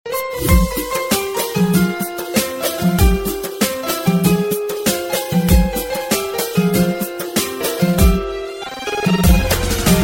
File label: Piano Dance